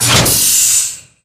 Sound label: sphere socket lock; Source Engine; Portal 2